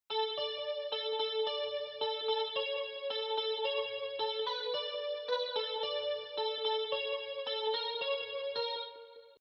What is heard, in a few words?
Guitar